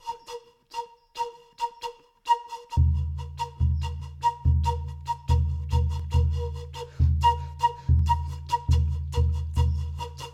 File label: Pikilkas